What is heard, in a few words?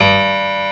PIANO G1; AKAI S6000 CD-ROM - Volume 1; PIANOS